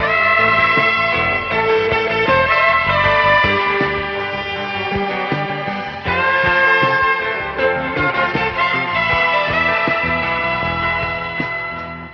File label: guitar, Loops Phonky